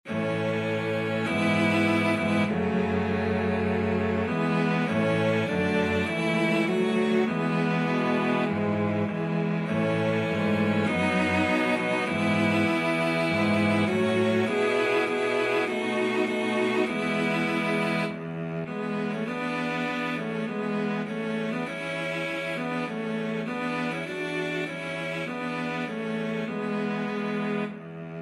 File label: cello quartet